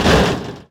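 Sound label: impact vehicle hard; Cars; XBOX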